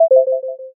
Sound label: error